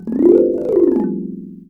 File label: RANATTHUN; Spectrasonics - Heart of Asia CD2